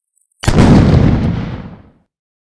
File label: grenade you